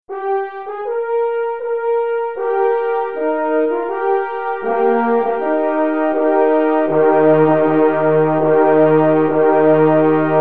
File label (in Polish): Hejnal mysliwski na 4 parforsy in Es lub waltornie; F. Nowowiejski; Prezydenta RP; wersja oryginalna